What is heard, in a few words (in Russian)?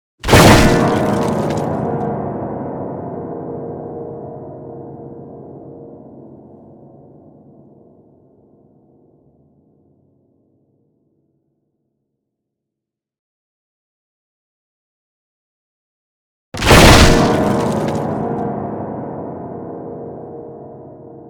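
piano, grand piano smashed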